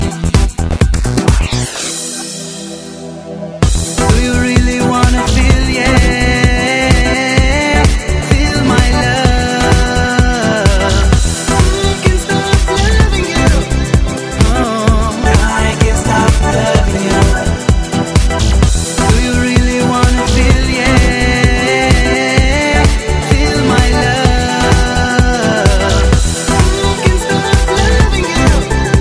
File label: Club House Vibe